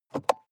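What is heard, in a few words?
sports car interior compartment open medium; verkehr transport; autos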